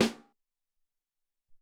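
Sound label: Brush Snare 1, Alesis SR-18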